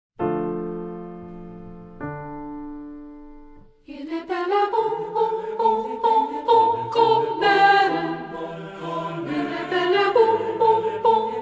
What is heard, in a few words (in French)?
Il est bel et bon sopran